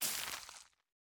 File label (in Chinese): 施加骨粉声